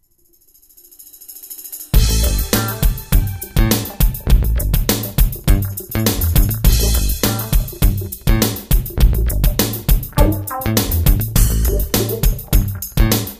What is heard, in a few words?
No Sax No Sax Double No Horns Key Of A minor